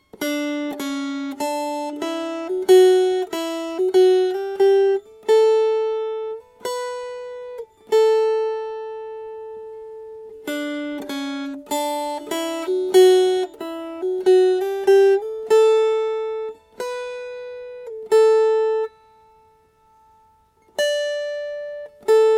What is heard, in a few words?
Shepherds Came to Bethlehem Melody